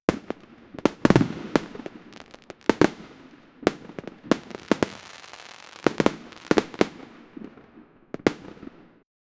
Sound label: chiptune fireworks